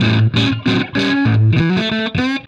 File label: BLUES GUITAR; Sonic Foundry (Sony Creative Software); Vital Drums